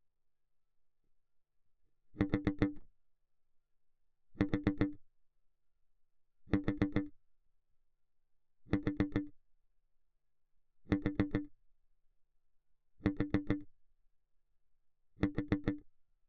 Bass Mute

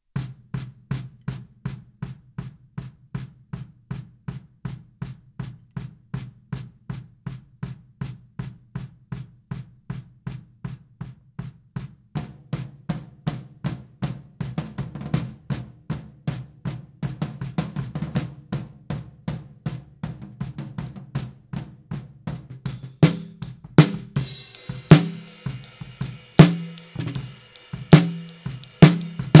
Snare Top, 160 BPM

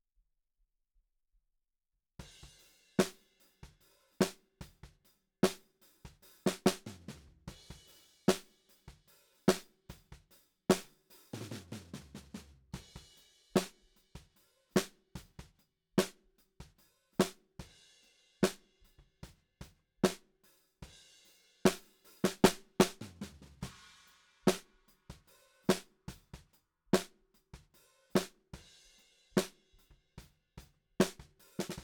Snare Bot

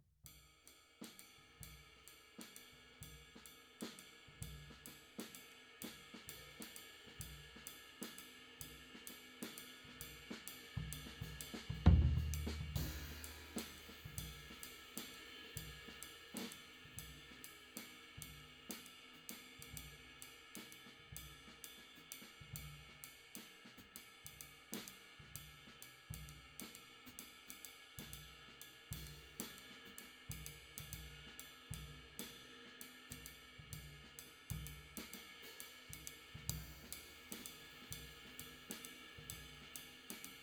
Floor

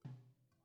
BD OUT

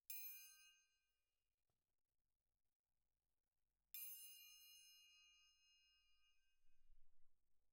Perc

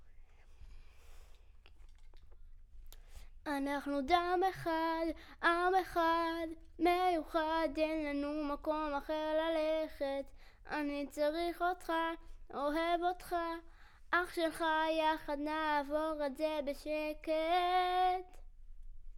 Children Choir; Studio Bekol Children Choir

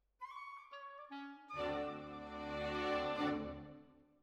Violins Over

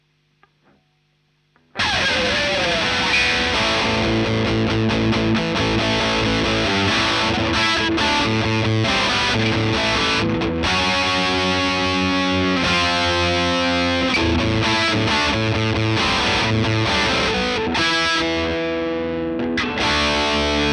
Marshal Gtr

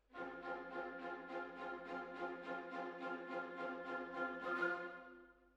Hall

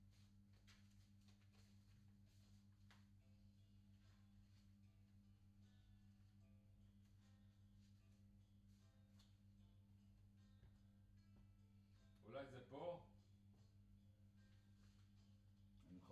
Hammond Leslie

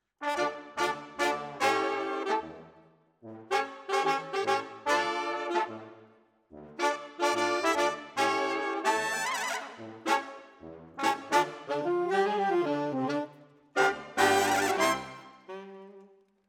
Sax

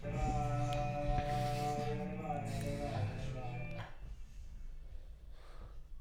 VOC

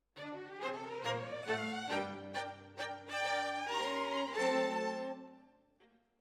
Violins, jazz for piano

